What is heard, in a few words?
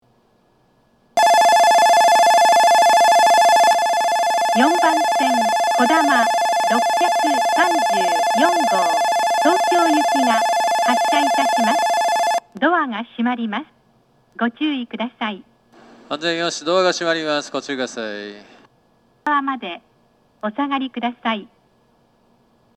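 Kakegawa departure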